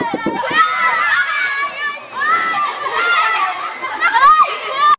igrovaya ploschadka beg i kriki; zvuki detskogo bega

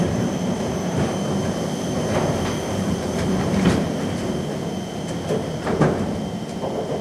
stalnoe serdtse prosyipaetsya, zvuki eskalatora

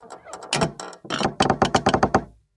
rjavyie petli sunduka skripyat

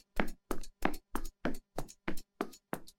rebnok bejit po parketu; zvuki detskogo bega

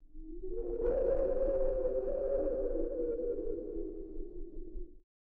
krasnaya planeta nejnyiy svist

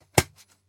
poymali disk shlepok ruk; zvuki bumeranga

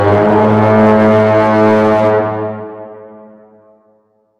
rog gondora epicheskiy; zvuki gorna

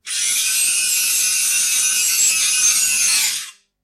polirovka zuba jujjanie

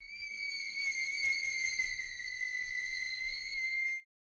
kosmicheskiy gost usilivayuschiysya voy; zvuki meteorita asteroida

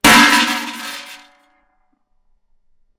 petarda v vedre gulko; zvuk shutih

